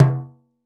Dof Dom, Percussion; Roland BK-5

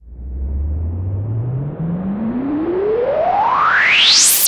Sweeps Up, Effects

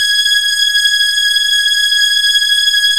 HIGH STRINGS

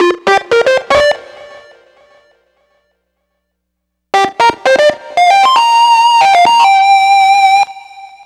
Grunge Lead; Dr. Fink's Funk Factory

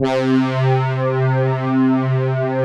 HORN STR; Pro-Rec - Synthsonic; JV ANALOG

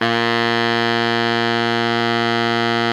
BARI MF A#1, Sax; Giga Samples Collection; BARI QUICK

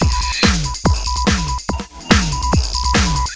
Melded, Drums Beats

